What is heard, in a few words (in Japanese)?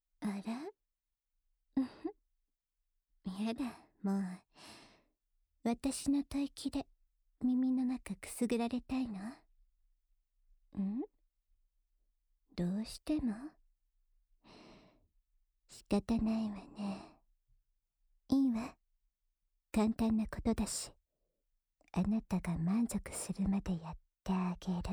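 安眠用お耳ふーふー（右耳）, ASMR; 【30時間・限定特典も全部収録!】青春べろちゅー委員会♪ コンプリートパック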